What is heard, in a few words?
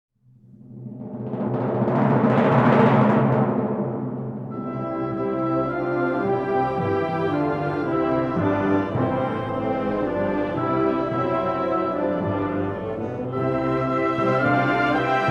European Anthem original